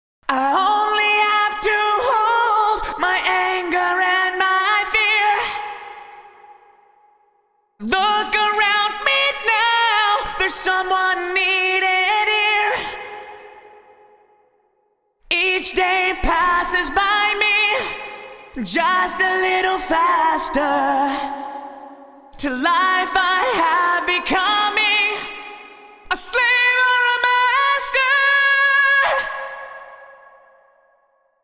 Vocals TC Electronics Reverb 4000 Large Hall 2.6s PD30ms; Vintage Digital